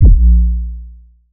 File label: 808 one shot Bb; Slate Digital BASSHOLE Sample Pack